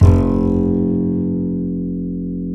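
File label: double bass F2, Jazzistic; Univers Sons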